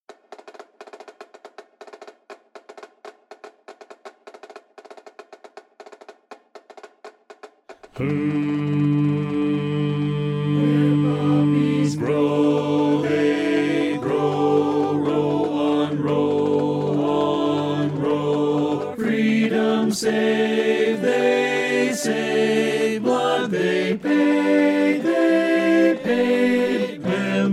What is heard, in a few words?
Baritone